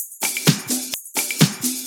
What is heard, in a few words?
Percussive Loops